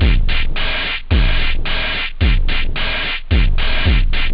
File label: hard, techno; OctaMED SoundStudio V1